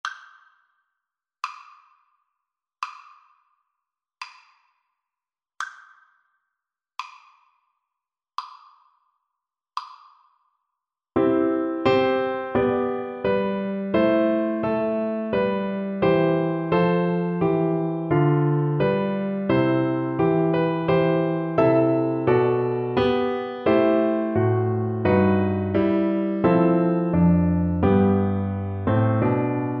sonata, Cello; marcello; op2 no5 mvt1

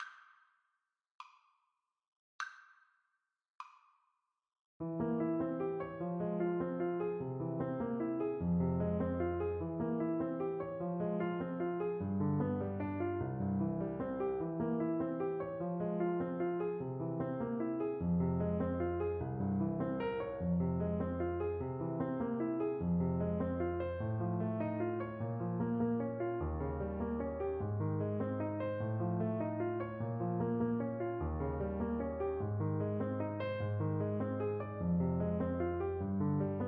chason, Trumpet; smetana

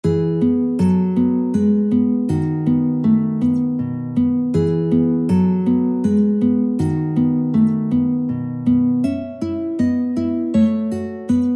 lagrima guitar; tarrega

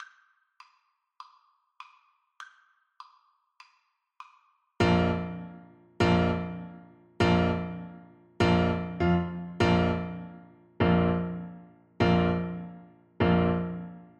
1812, Trumpet

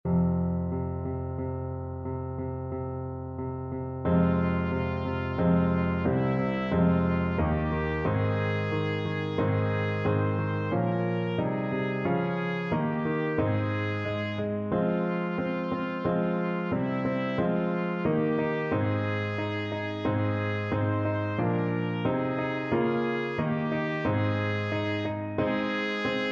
trumpet

